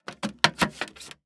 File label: Foley Footstep Hard Sole Shuffle On Hollow Wood; Foley Footsteps